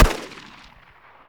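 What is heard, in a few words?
9 mm exterior shot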